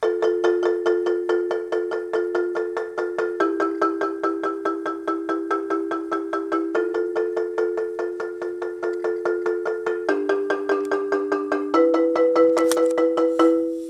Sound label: c part, marimba